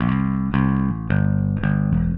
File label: Bass, Indie Rock